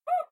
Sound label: whoo